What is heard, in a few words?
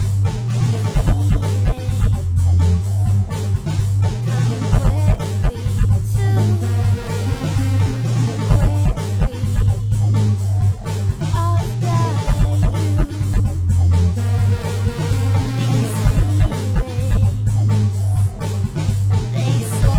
celebrate harmonic